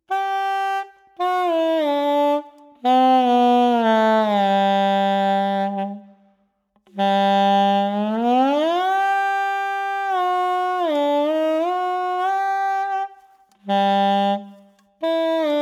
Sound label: SAX SLIDE; timbri